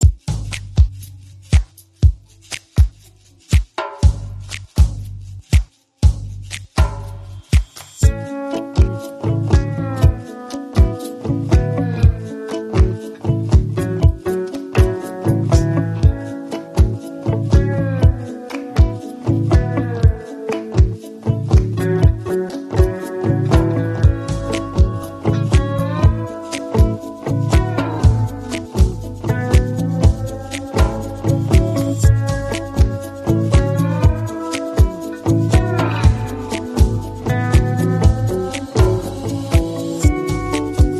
Slidey Guitar; Doki Doki Universe; PS Vita, PS3, PS4

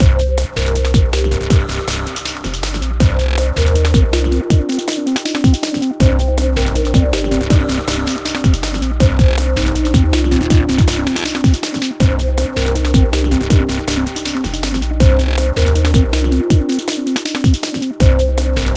Space DnB

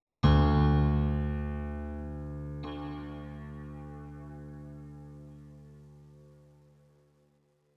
One shot Piano

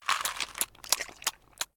Zombie Eat; Zombie Catchers

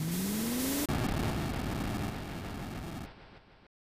Jet Engine; Metal Slader Glory Director's Cut; snes

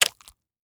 human guts drop splat

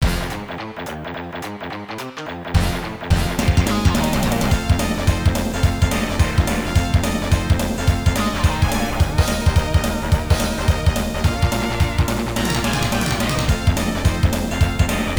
Blues; Teenage Mutant Hero Turtles Turtles in Time; Sunset Riders; arcade; Alleycat Blues (Scene 2)

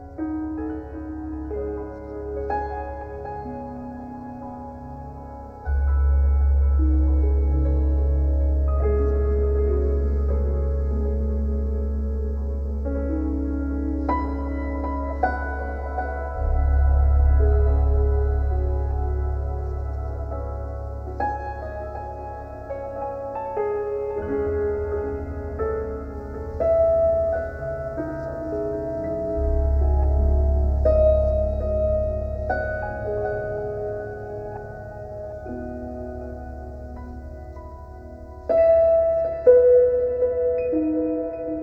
Piano; Flesh Blood Concrete

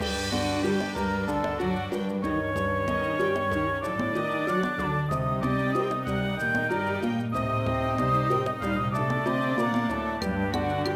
Field Forest Loop Bright; Glitch Busters Stuck on You

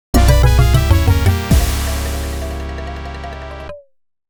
Fail; the punchuin

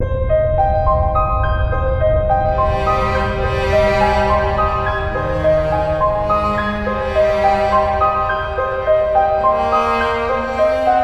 Final Plane Takeoff; Call of Duty Black Ops 2 Zombies; Mob of the Dead

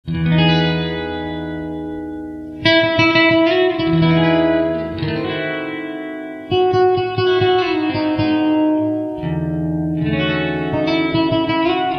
Flamenco Fusion, Sweet; Playboy The Mansion

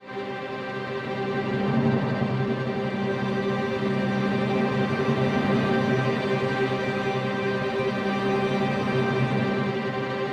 Party Crasher - Combo Paper Pad; Bee Movie Game

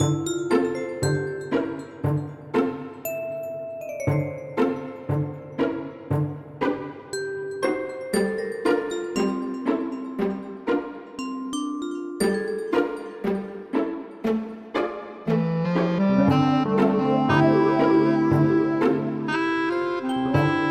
Banquet Waltz; Honey Select 2 Libido

